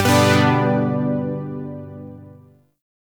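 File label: Shawzin Major Chord H; Zariman Shawzin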